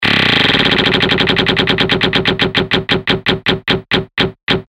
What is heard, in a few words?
roulette